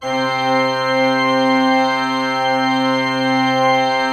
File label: C3, Cathedral Organ